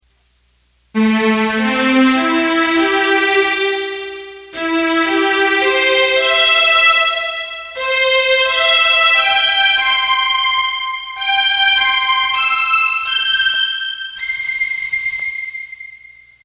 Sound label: Orchestral; ketron